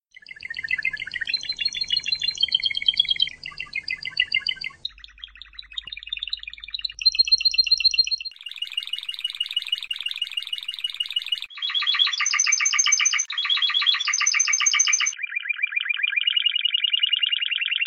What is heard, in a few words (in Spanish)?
Cloqueos, timbrado español; θετικές νότες